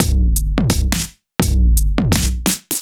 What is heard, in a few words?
Kit Heavy Swing, 85bpm; off the grid